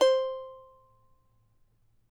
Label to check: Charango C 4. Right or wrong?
Right